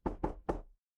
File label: door knock